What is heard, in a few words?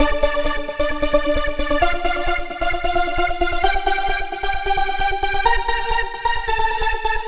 Lead Synth